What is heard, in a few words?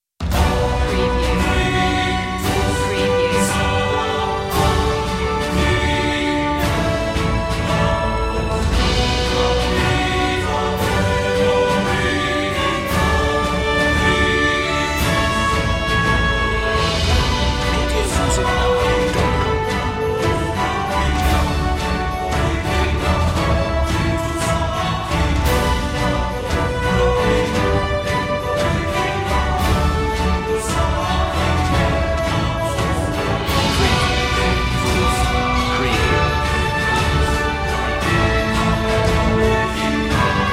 no rock drums